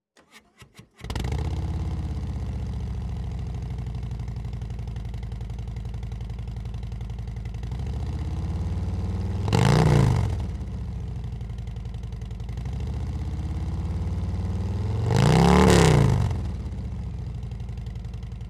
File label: Road Glide ST; Глушитель Rinehart HP45XL для Harley Touring 2017+; Harley-Davidson